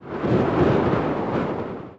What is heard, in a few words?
wind